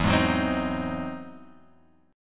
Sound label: E Augmented Major 9th E down Guitar Open F; strummed